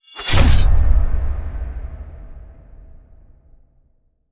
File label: damage taken aerial